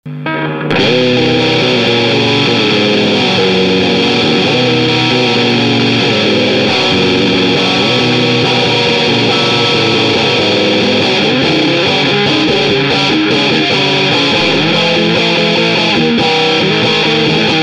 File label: SINEI BIG MUFF